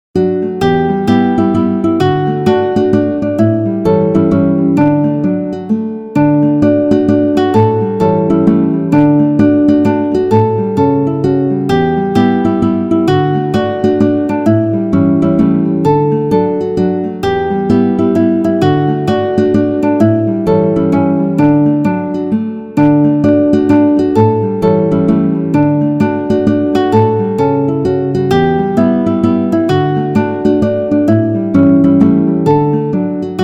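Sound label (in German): Am Fenster heute morgen, Gitarre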